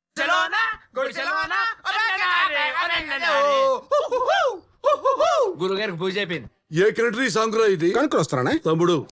vocals

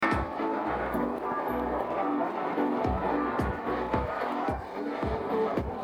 no vocals

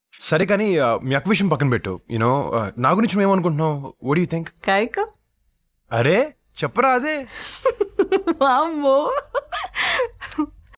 happy vocals

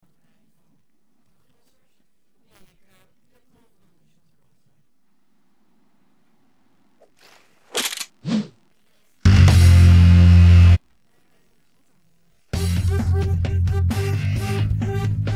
no vocals

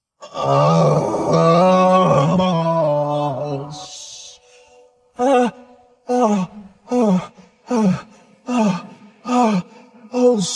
trap remix, vocals; ambatakam trap remix

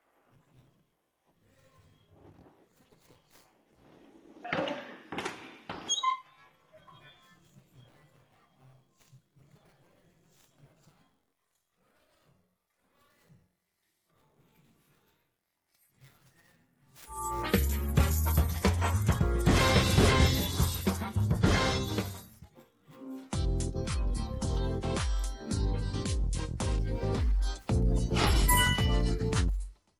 slots casino games no vocals; club vegas slots

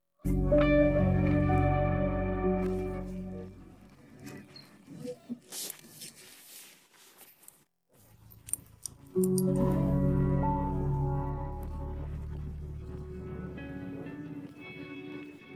no vocals

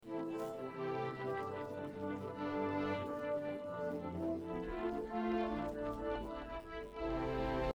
no vocals

